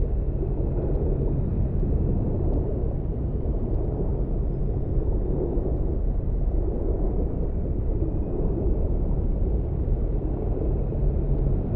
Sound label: ship destroyer idle; aquatics